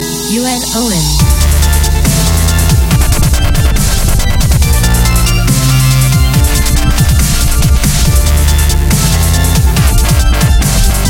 Dubstepp; Flandres

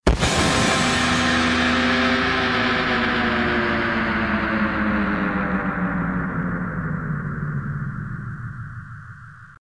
Dog

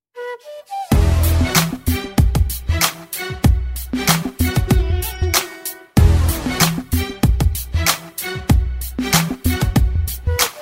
Hip Hop

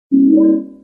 Item Pick Up

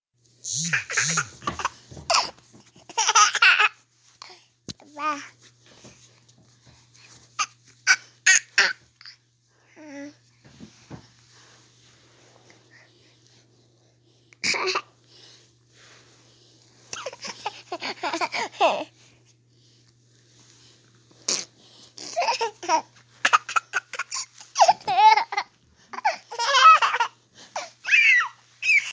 Laughing Baby

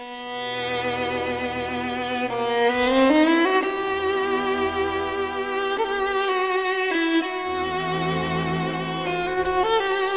Violin Balad

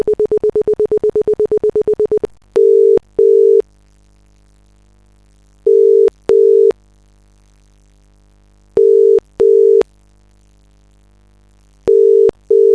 Irish Cellphone Ring

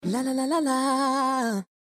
Lalala; T mobile

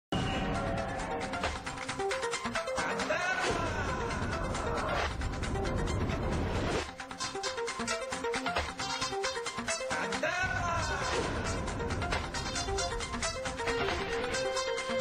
Intro Tune

